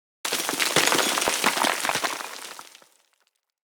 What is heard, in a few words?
Generic Explosion Rocks Large; Surface Hit Effects